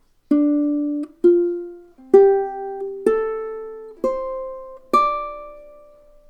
dm pentatonic, ukulele

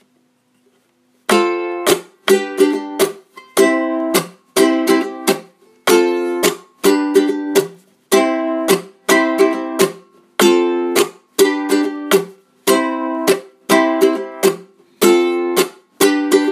Chorus and Bridge Strum Pattern, ukulele

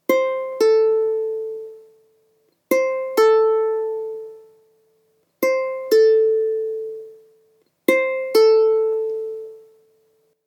pull off, ukulele

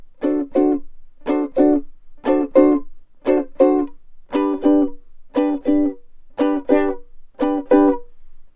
double chop, ukulele

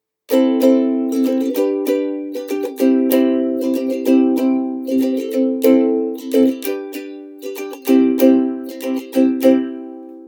pattern, ukulele

